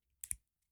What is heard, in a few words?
Clings, Clothes